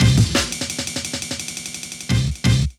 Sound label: Amen Fill C, breaks